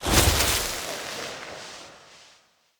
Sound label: Stone Projectile; Powers; Fey; Ward